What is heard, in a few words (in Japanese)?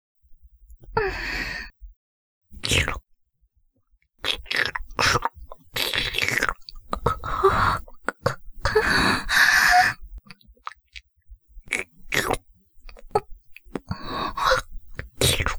耳舐め, ASMR; ＳＥなし